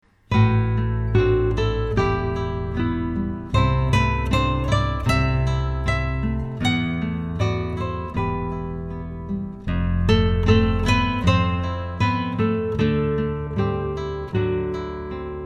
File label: guitar talk